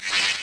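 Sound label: synth bug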